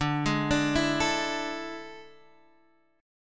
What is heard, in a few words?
A Major 7th Suspended 4th D